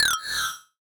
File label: Jingle Game Paused; Garfield Kart Furious Racing